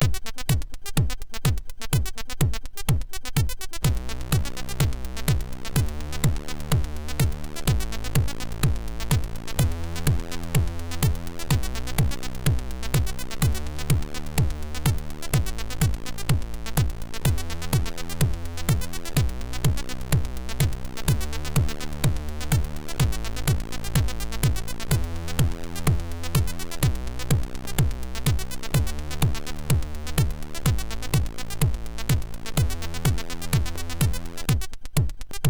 MOS6581

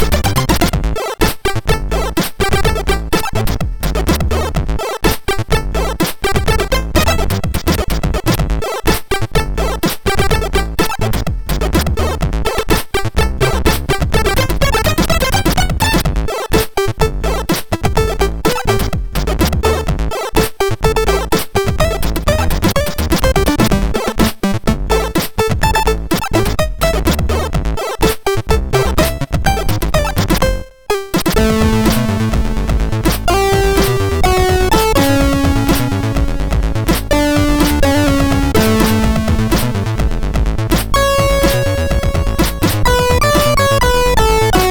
Shovel Funk, MOS6581R2

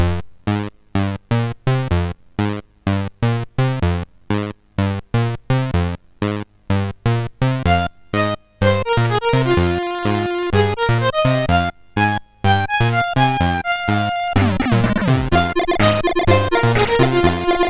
Ze Rythem sid MOS6581R4

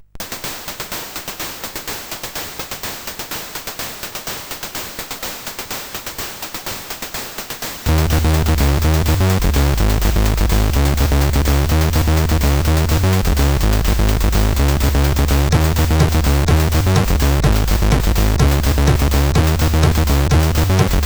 Housemix, CSG8580R5

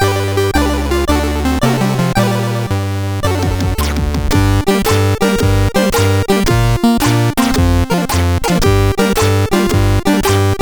Tetris, sid CSG8580R5

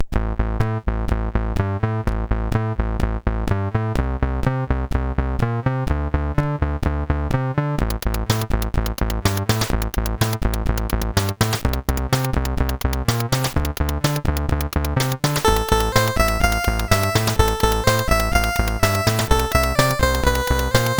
Bass Fever sid CSG8580R5

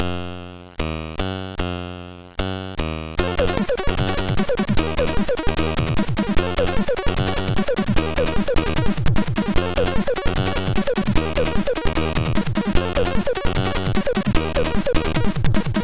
Arabian Techno sid MOS6581

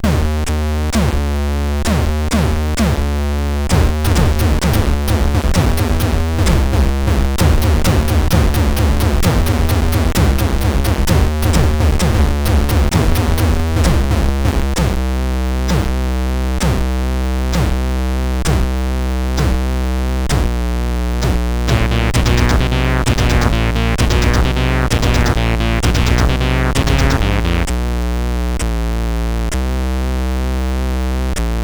War Drums; CSG8580R5

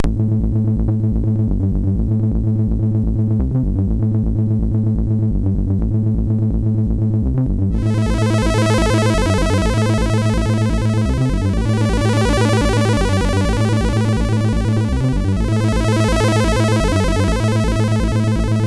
Intro sid MOS6581R4

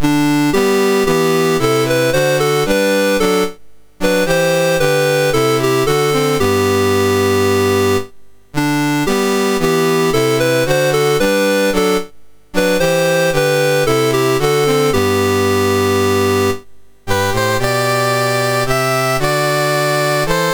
Netherlands, National Anthems; MOS6581R3; Dave Marquis

